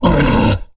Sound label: hurt, aliens; ortnok